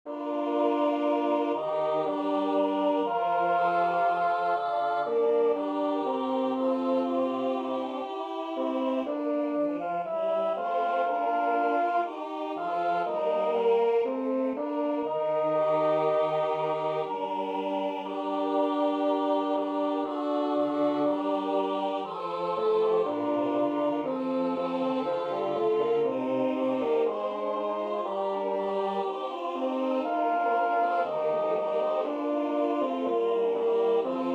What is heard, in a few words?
tenor